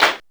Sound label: SP1200; DRUMMACHINES